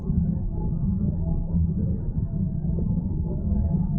Tonal Re Draw, sparse soundscape

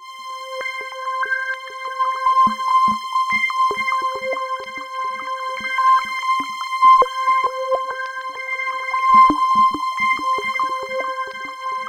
C5, SynthLine; 90 s Sample CDs; NiceBeats; SynthLine VOL-10

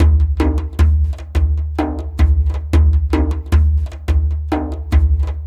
Dumbek Fast, Ethnic Percussion; Sonic Foundry (Sony Creative Software); Ambient Atmospheres & Rhythms

